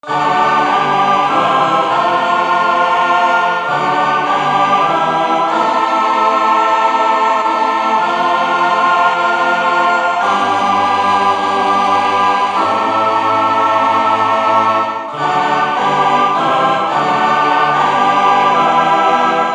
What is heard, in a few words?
8 Voice Choir